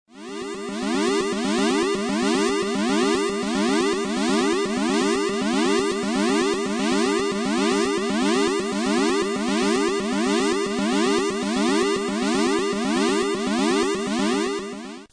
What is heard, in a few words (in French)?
sirene